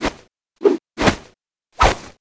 skill defence bat hit; lucy

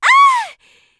voice Player Down; Shua

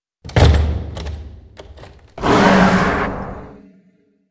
skill coffin; dhan

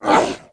voice stroke; Lizard

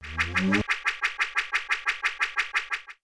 battle item Shield

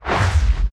battle item homing soul damage

